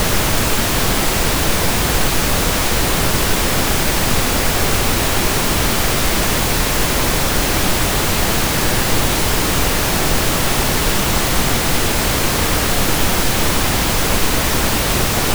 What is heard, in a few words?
Pink Noise; headphonebreakin